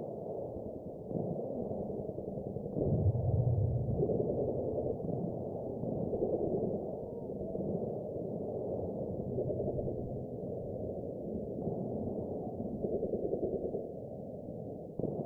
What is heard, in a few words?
Background Ambience